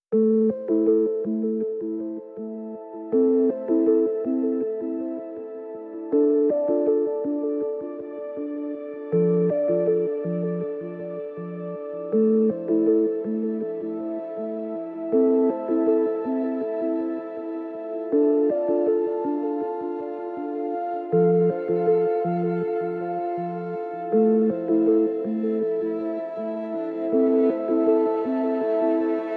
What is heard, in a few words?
Ambience - Restful Mind; Instrumental